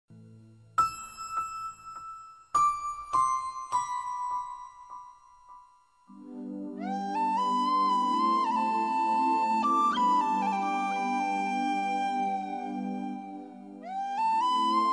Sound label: Sweet Flute